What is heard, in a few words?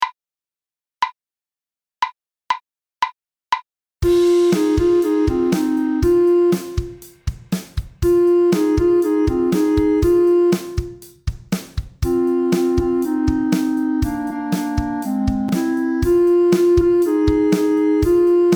quarantine rock